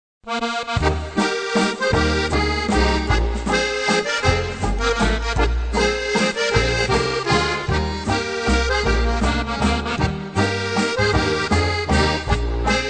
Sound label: waltz